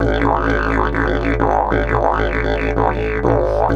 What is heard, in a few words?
130, Didgeridoo